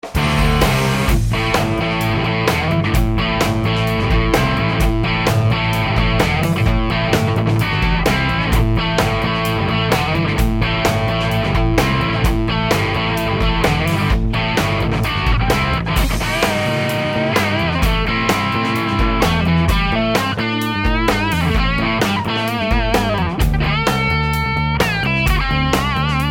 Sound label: higain bridge, Tele; schwarzbach; VP-Tele-57